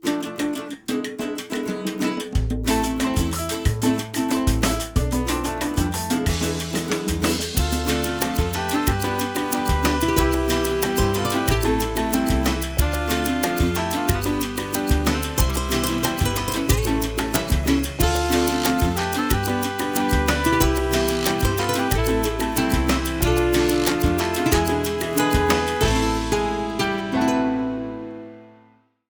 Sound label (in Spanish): Salsa